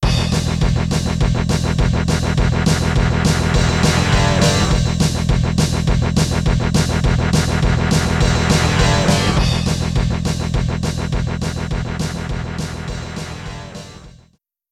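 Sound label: Movie background music, Rock; Mario Artist Talent Studio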